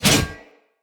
Cleaver Whoosh, Swords; Weapons; Player; Bromius Cleaver

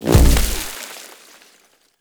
Venus Hoverboard Land Snow, Vehicles; Warframe

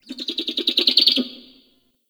Owl Critters Perched Ground; Warframe; Duviri; Ambience

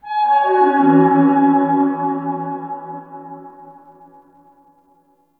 Cascading Fifths Flower Structure

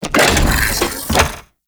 Venus Unicycle Door Open

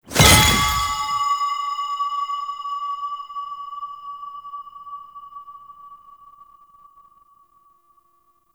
Crystal Cluster Spawn, UI; Citrines Last Wish

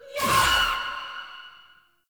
Choir Erupt Proj Throw

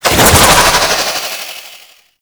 Manic Dodge Movement, Foley; Warframe; Grineer

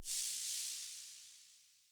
Ship Descent Elec FX; Ships

